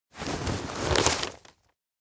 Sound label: luggage map take; anemoi